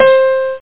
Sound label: Piano note